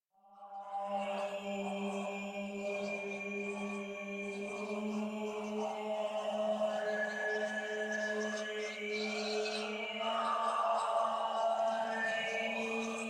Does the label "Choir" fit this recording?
Yes